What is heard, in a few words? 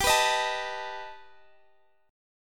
G Augmented Add 9th, chord